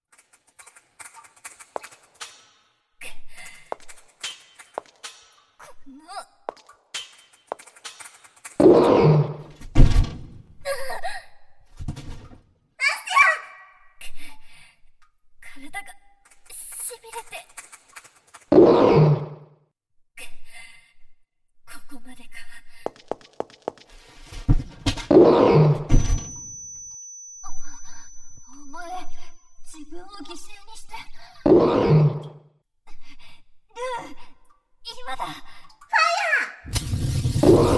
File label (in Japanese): 地下洞窟, ASMR